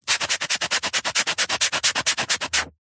foley abrasive foam or sponge rub clean concrete fast scrapes